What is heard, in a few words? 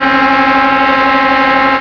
klaxon, Train; OpenBVE; Bzmot